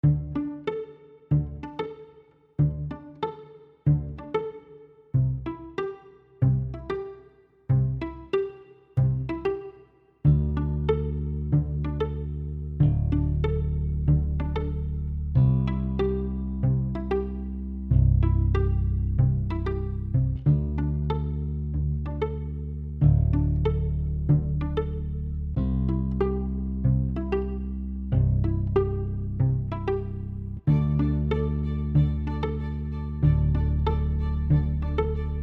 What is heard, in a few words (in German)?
Kuschel Uke